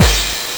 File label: Game Boy Combo Loop, 8-Bit, Drums